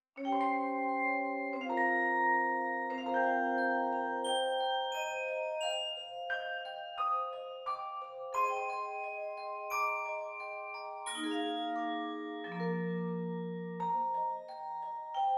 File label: Music box